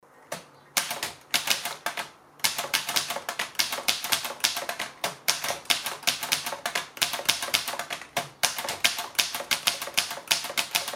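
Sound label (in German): groma modell gromina, Schreibmaschine; Hörbeispiel Groma Gromina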